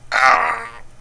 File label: pain, csgo french bot; csco